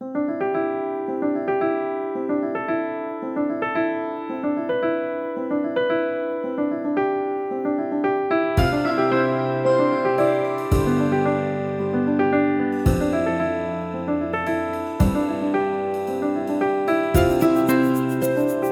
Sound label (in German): warteschleife